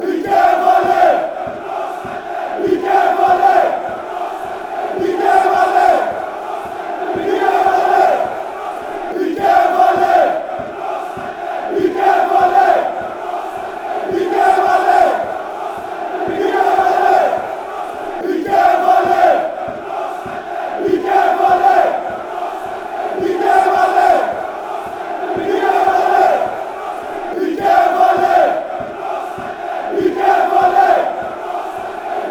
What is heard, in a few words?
chant, aek athens